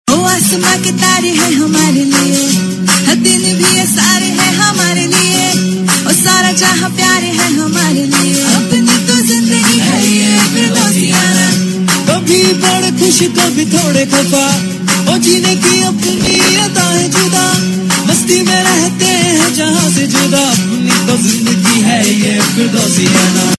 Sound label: Happy Tune; Nisha Aur Uske Cousins